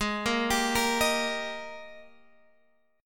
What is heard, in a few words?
Ab Major 7th Suspended 2nd, down, Guitar, Open G